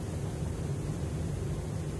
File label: stn aircon; tetsuon